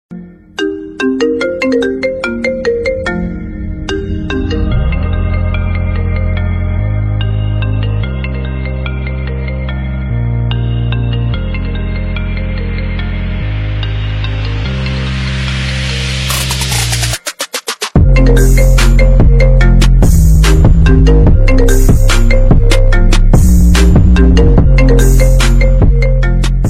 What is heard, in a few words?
iphone trap remix